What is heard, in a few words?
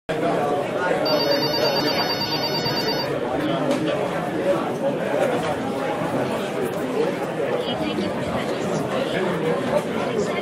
Call Center Sounds Work From Home Office Ambience